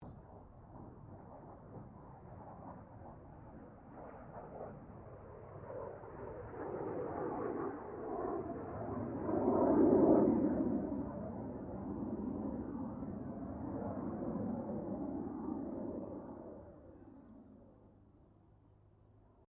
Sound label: AIRCRAFT CESSNA A-37B DRAGONFLY PASS DISTANT, Jet Fighter /Bomber; Airplanes; Vehicles